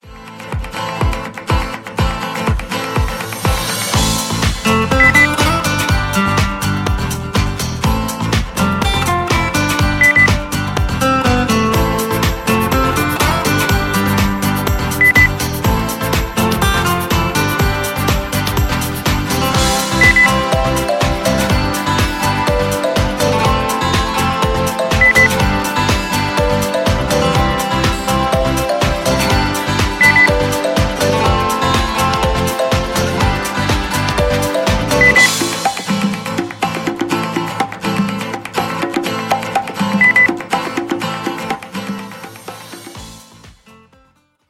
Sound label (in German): gute laune